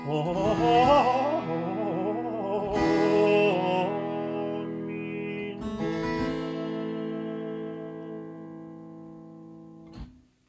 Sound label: solo, Coloratura or Gorgia, trillo again